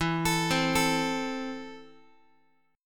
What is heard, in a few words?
E Suspended 4th Sharp 5th, Guitar; Esus4#5; arpeggiated; x,12,12,10,13,x